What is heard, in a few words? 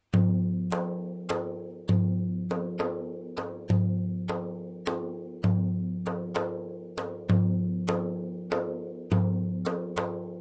tar